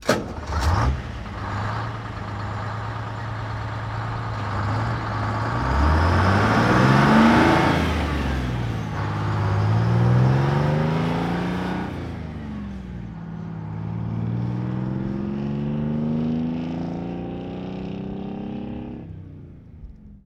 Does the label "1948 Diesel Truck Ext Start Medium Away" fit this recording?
Yes